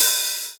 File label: Hi Hat Open; drumthumper; oboe